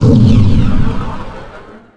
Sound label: Sonic Fire, Sonic Gun